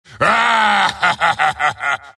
wraith laugh; dota; skeleton king

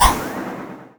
stifling dagger cast; weapons; dota; phantom assassin

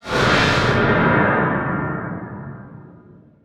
shield; weapons; dota; pangolier

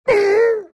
axe pain; dota

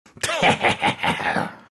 vipe laugh; dota; viper

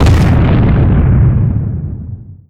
body impact giant, deaths

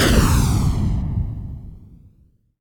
recall fail; weapons; dota; keeper

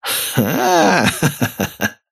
wheel laugh; dota; kez

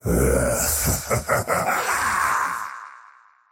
short laugh; dota; faceless void

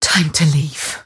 teleport pain; dota; mirana

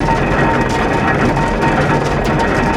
battery assault loop; weapons; dota; rattletrap

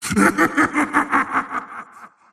wheel laugh; dota; razor

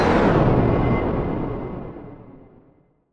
hunger blade slash layer; weapons; dota; kez

